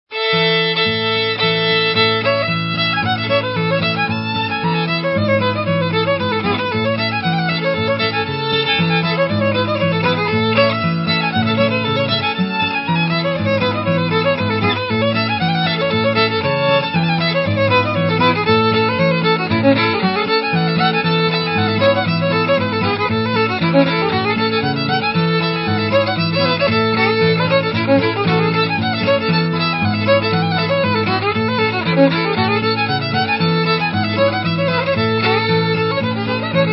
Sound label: Hornpipe